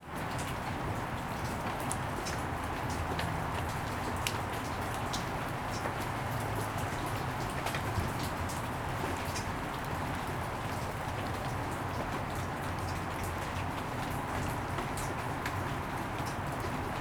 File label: Rain On Concrete, Distant Traffic, alley; Stereo; Surround The Rain 5.1